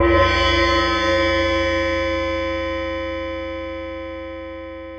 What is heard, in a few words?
cymb